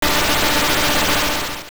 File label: Lasers; Medelock firing lasers (similar to a repeated version of the Boss Shoot sound); Zelda Oracle